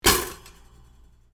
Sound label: toaster; cooking